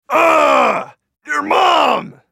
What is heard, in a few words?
dying; Brolaf; Character Voices - English